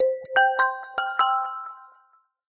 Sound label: Iron, notifications; Honor; X5